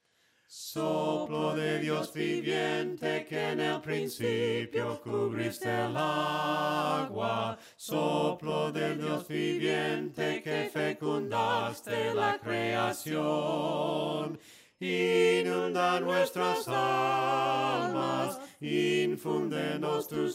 VOCES, himnos a capella; cantos del camino

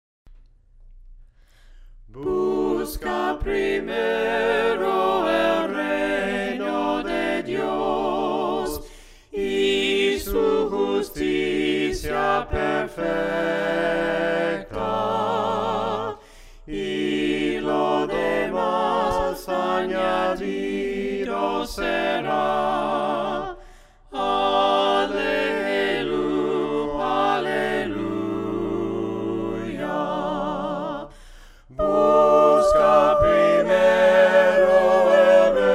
VOCES, himnos a capella; cantos del camino

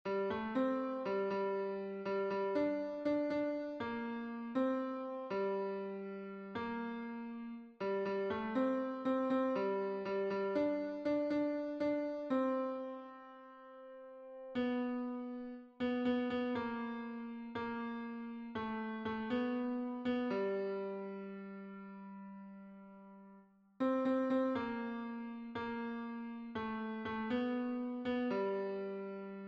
TENOR, himnos en piano; CON LOS ANGELES; cantos del camino

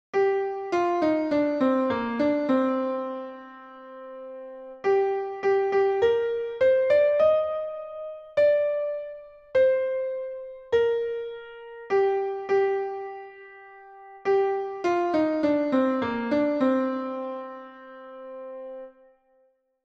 soprano y contralto, himnos en piano; CANTA ALABANZAS AL SENOR; cantos del camino

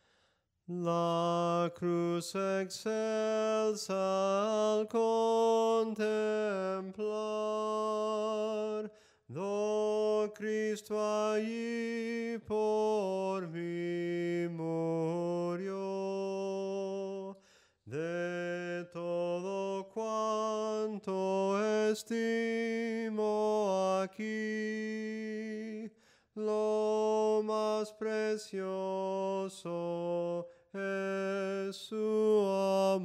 SOPRANO CANTADO, himnos en melodia soprano

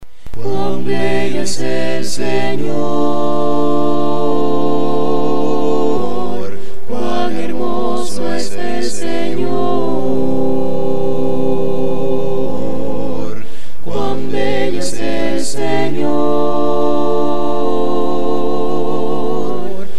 CUAN BELLO ES EL SENOR, himnos a capella; cantos del camino

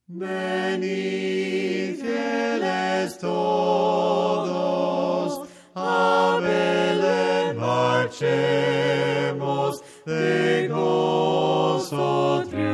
VOCES, himnos a capella; cantos del camino; VENID FIELES TODOS

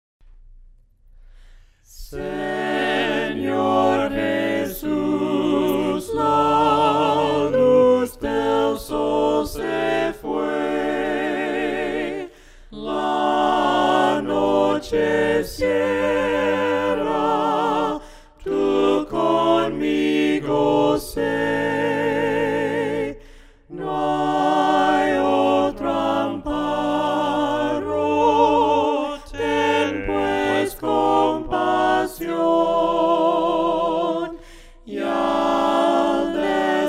VOCES, himnos a capella; cantos del camino; SENOR JESUS LA LUZ DEL SOL SE FUE

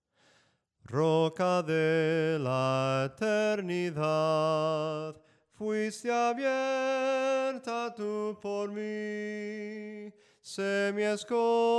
SOPRANO CANTADO, himnos en melodia soprano

